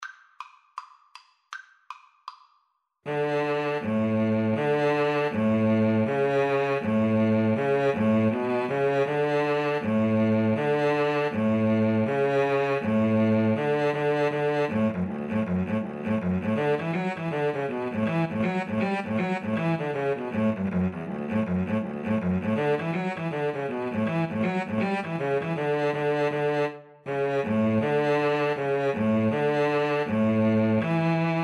the harvest home, Violin Cello Duet

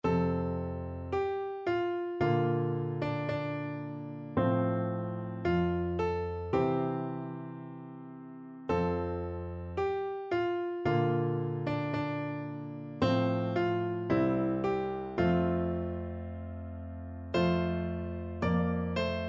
nearer my god, piano duet

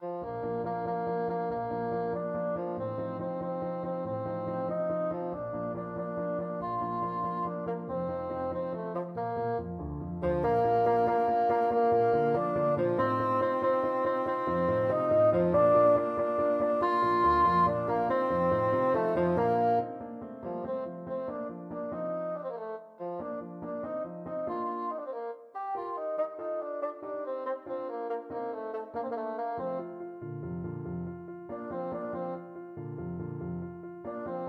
bassoon

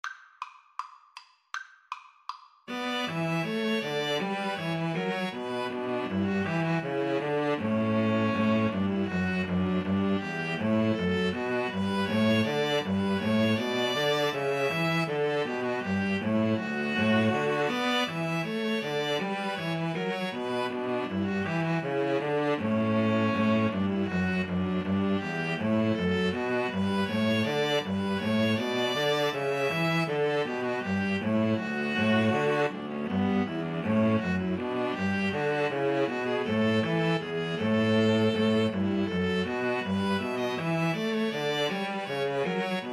bourree, String trio

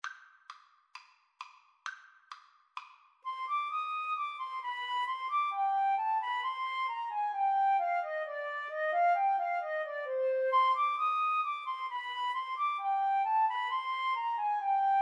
bourree, Flute-Clarinet Duet; bach

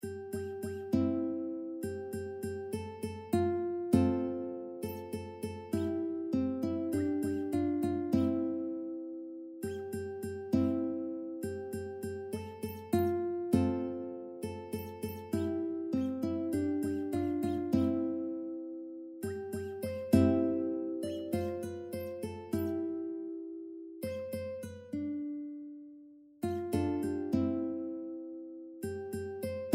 ukulele

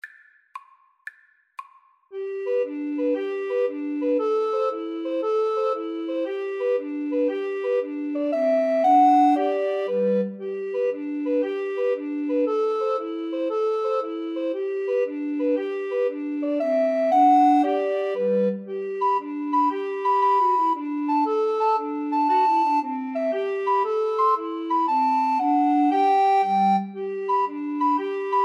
irish washerwoman, Recorder Trio

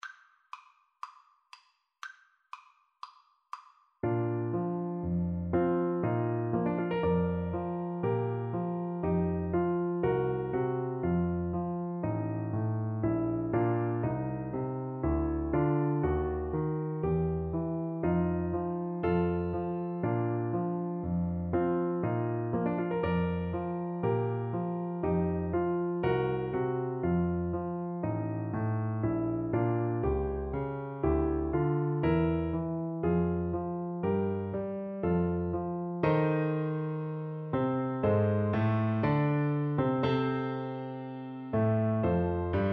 reed pipes, Bassoon

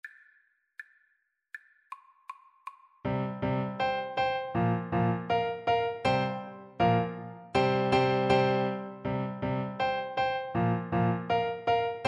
thumping blues, Flute

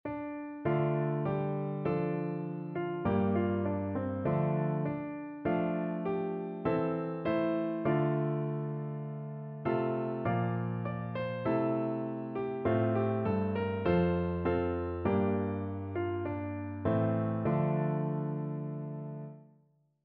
all that i was, piano

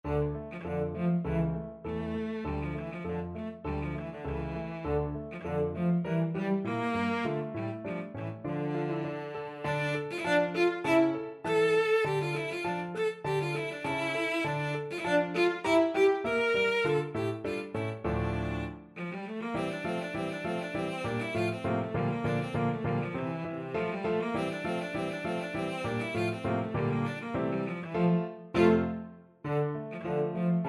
tambourin, cello

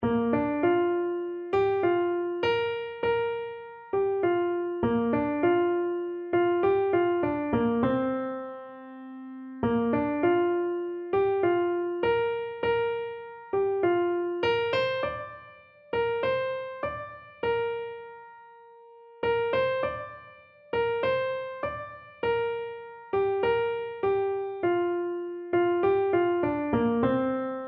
there is a fountain, keyboard

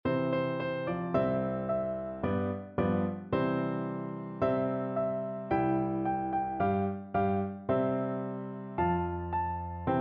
diddle diddle, piano duet

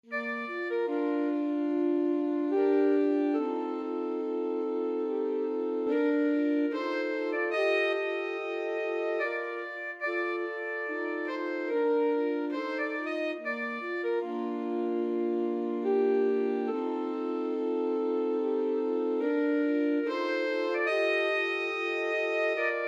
alto sax quartet

